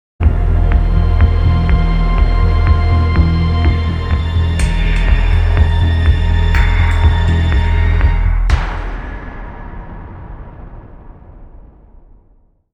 Suspense Link; Jingles medley

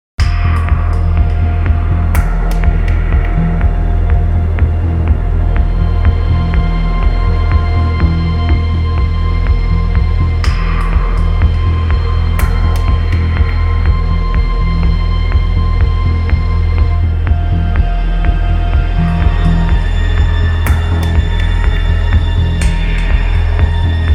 Suspense jingle; Jingles medley

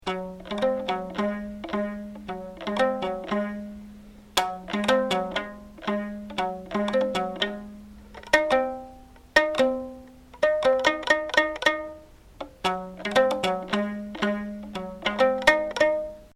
elasticophone

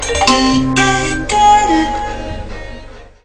SNCF; depuis 2005

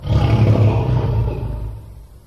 lion; Cirque

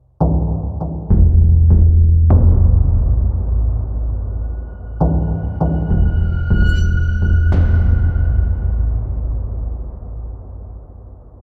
Suspense Link; Jingles medley

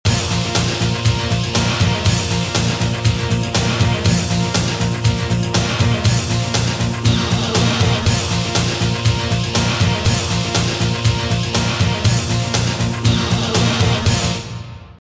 Action jingle; Jingles medley

